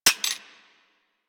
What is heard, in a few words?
Pistol Mech; Sentient; Crp Sent Amalg Pistol